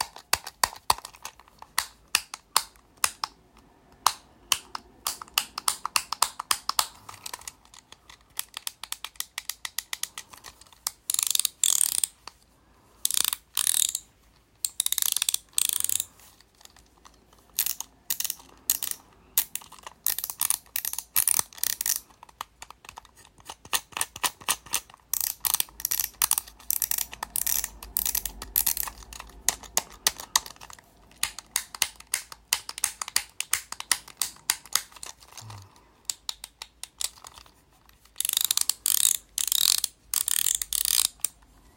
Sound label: fidget cube; Golden Crayon Sounds